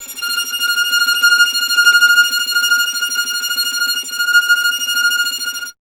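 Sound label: string violin tremolo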